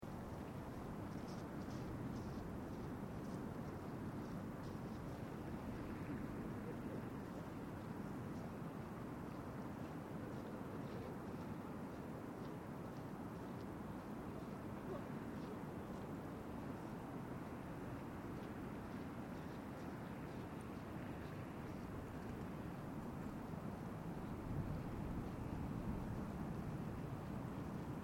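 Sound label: Distant City, Distant city ambience. Use as city wash outside apartment window etc.; Distant city traffic; City Sounds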